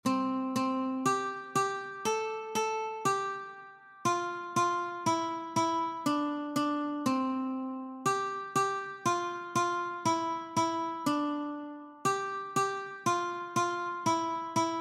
Twinkle Twinkle Little Star Ukulele Several Strings